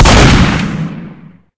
shotgun fire

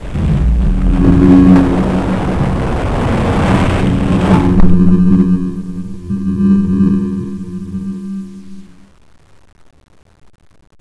lever

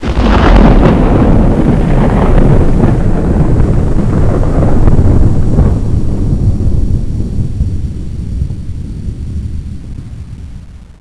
suckin, ambience

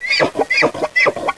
pit drone communicate; betapitdrone

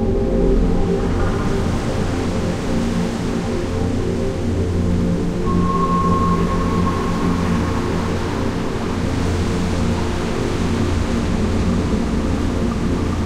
atmos music

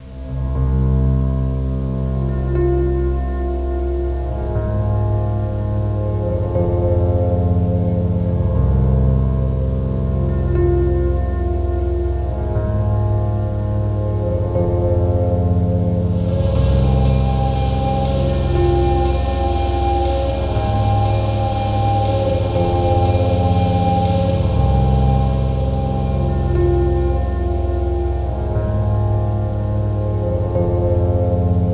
backstage

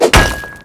metal pick stone

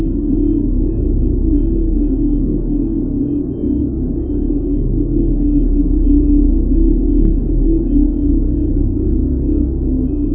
crystal drone

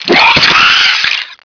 zombie idle; zombie soldier

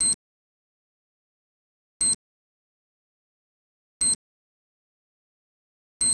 beep

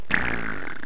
player duct

